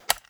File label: Button